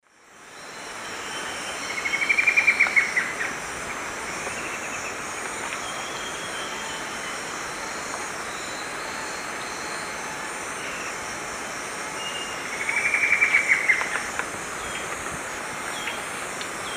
Herpsilochmus gentryi, song, S. America Eastern Lowlands